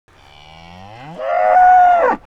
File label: Feed ant sidebands heifer